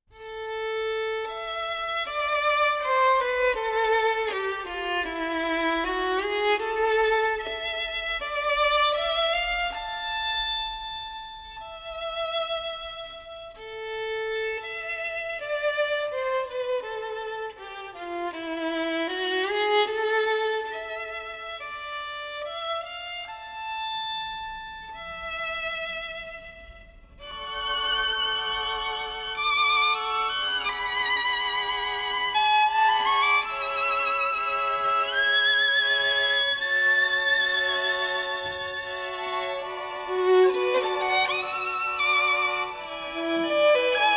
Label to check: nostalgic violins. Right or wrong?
Right